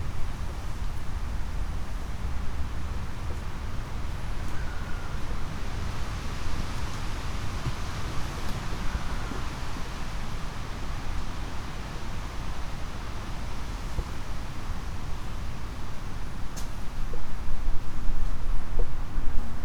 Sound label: Wind in Trees